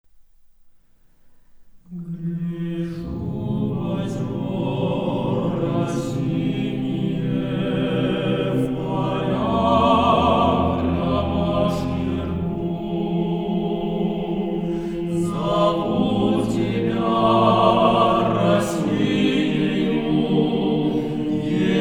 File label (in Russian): Хор